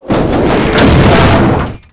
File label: container crash; Chase Hollywood Stunt Driver; XBOX